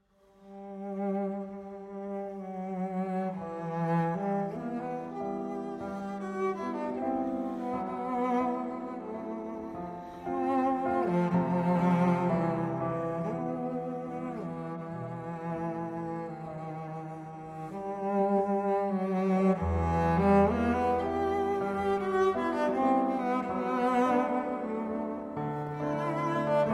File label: Valse sentimentale for Cello and Piano